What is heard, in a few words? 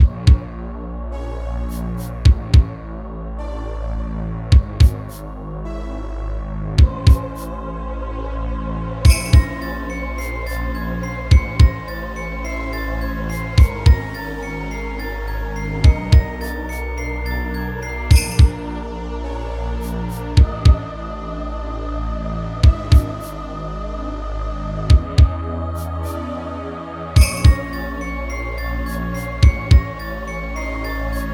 Horror